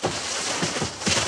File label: body fall; JERRY APPS WINTER